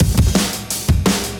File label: Drum loops, Boombap